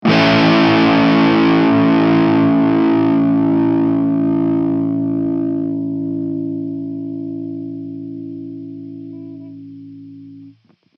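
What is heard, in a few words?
Notes Distorted A major; Hylics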